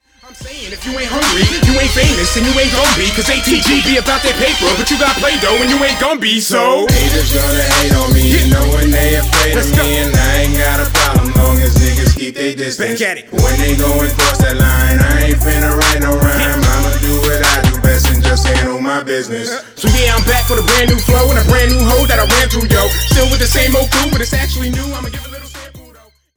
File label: HIP HOP MIX